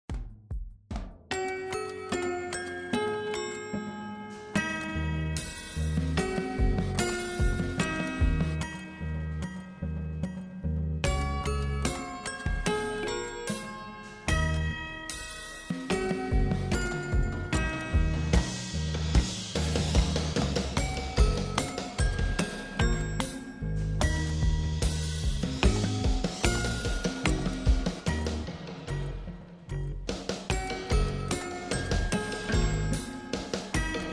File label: Building center toy Piano; Wasteland Skirmish; MOVE 2